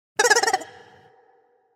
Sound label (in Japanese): ケロケロのおもちゃ